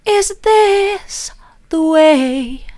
soft, vocal

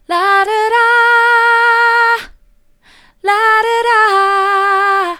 la do da soft, vocal

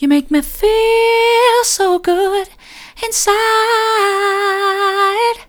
you make me feel so good inside soft, vocal

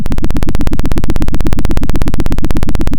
Sound of a helicopter

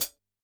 Pd Hat, drum

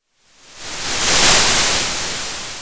Sound of waves crashing on a shore

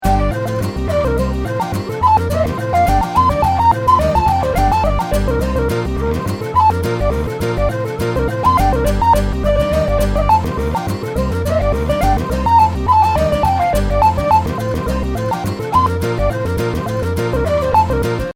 loop mono

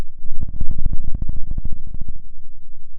pop, Sound of a dinosaur

